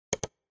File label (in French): clic